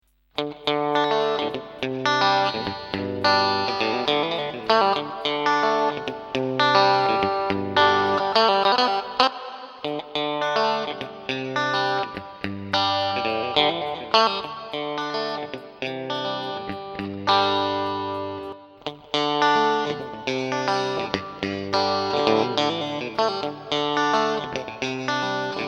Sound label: Strat Clean